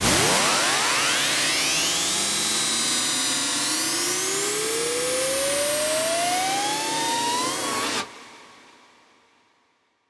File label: Force Riser